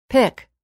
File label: pick